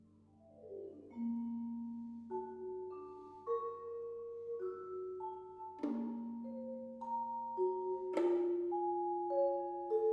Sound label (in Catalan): Ensemble Percussio; Desordre